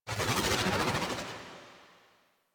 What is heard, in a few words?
Fairy Turn; DE; Warframe